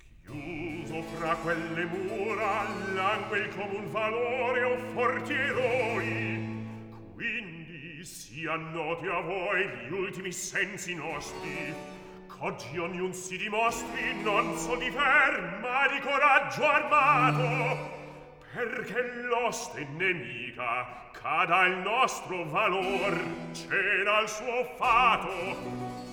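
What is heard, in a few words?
Recitativo, Handel opera; Act 3; Chiuso fra quelle mura; Handel - Rinaldo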